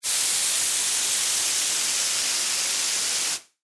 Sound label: Acid Burn Vampire, Horror, Acid, Burn, Vampire, Skin, Burned; Horror, Acid, Burn, Vampire, Skin, Burned, By, Holy, Water, Sun; Monster Sound Effects; BlastWave